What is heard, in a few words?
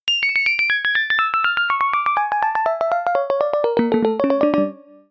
synthpiano termollo; zasfx